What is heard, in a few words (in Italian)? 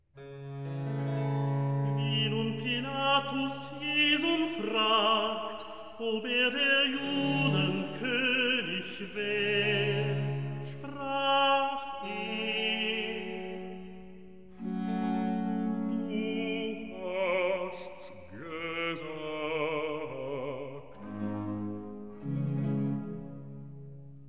Recitativo; Wie nun Pilatus Jesum fragt; Handel; Brockes Passion